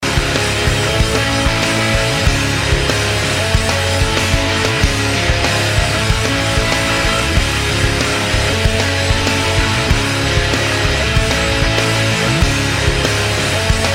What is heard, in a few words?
Guitars Metal